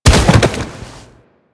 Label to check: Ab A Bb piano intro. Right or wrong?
Wrong